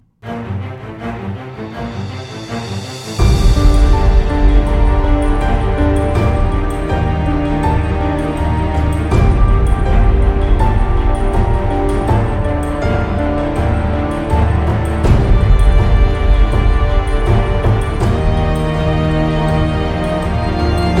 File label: suspence